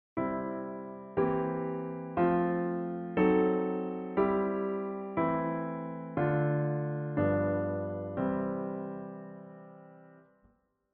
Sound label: Boesendorfer